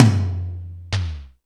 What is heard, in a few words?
Toms, E-MU Pro-Cussion